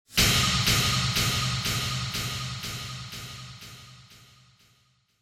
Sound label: Impact Delay; Onoma